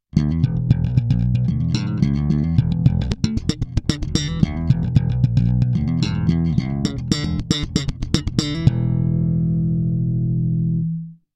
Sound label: MAXON CP9PRO+ slap bass